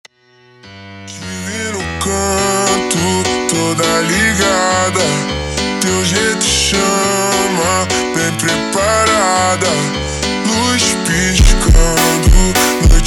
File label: super Slowed, funk